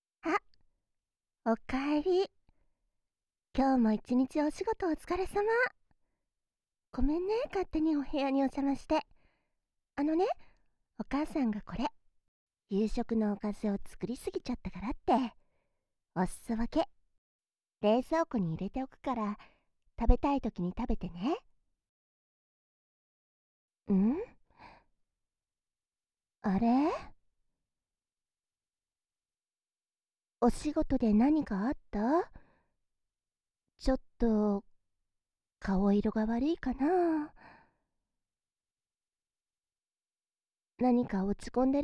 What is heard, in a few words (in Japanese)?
お隣のお姉さんがひたすらくすぐられる音声